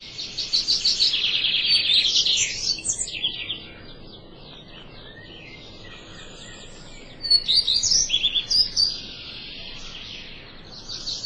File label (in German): Vogelmusik hintergrund